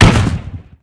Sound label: gun shot; Blind Drive